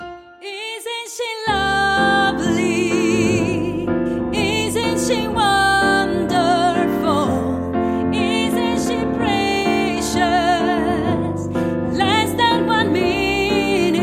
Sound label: italian female singing